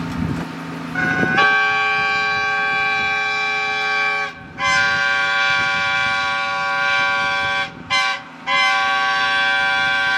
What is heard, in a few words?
Diesel, horns; trains